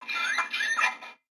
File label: Pet Dog Robotic Idle; creatures; pets; Lego